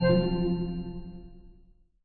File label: Hint; Microsoft Solitaire Collection